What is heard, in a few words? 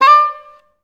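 English horns D4; Best Service; Peter Siedlaczek's Advanced Orchestra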